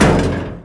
Small Impact; Totaled; XBOX

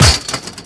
body impact fence

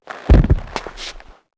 Dodge backward; characters

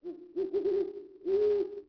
Ambient Owl; Cars; XBOX

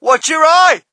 crowd, shout; Mike Tyson Heavyweight Boxing

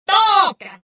pain, rodian

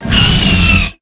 Rap Attack, Dino, Raptor

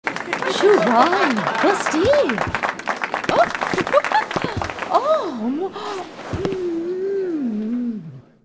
dance outro good; Sid Meiers Pirates; XBOX

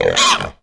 gamorean hit; Star Wars Knights Of The Old Republic I; XBOX

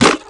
bmx land default; terrains; Tony Hawk's Project 8; XBOX

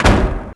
Door wooden slam closed; Max Payne

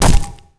character hit; projectiles; melee hit; Max Payne

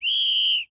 Single Whistle; Championship Manager 2006; XBOX

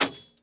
sword hit wood; weapons

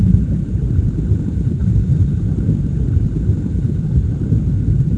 lava flow; The Elder Scrolls III Morrowind GOTY; XBOX

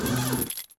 suspension, car; Cars; MATER; XBOX

